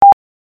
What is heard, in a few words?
Tone